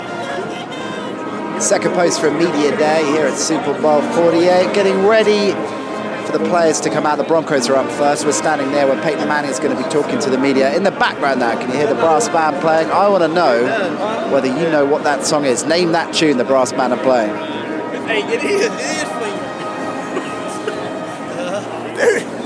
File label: brass band; s48 media day